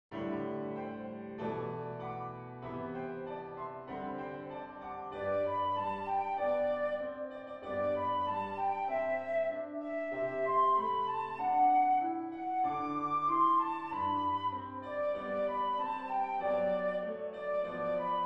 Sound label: jingle bells; pierpont james